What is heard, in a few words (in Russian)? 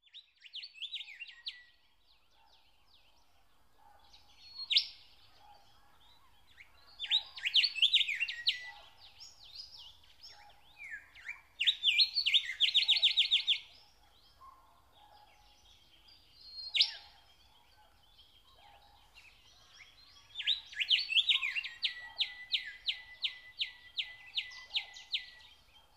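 Zvuki Solovia priroda, nightingale sounds nature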